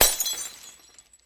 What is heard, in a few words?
glass; christmas lights